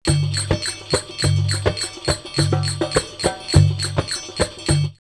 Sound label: Baghdad 104, drumloops World Ethnic; X-Static Goldmine